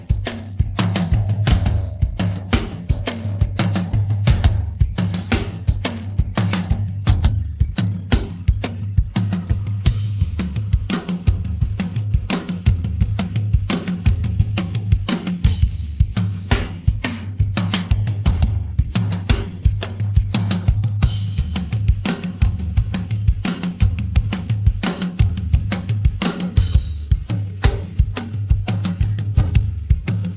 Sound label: drums